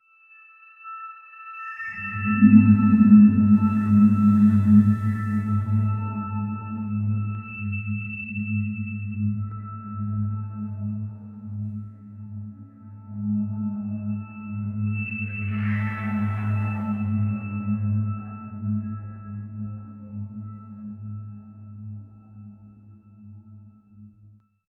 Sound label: a sound background for a, saturation